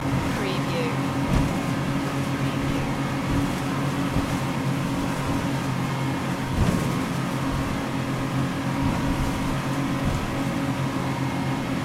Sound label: speed boat ride loop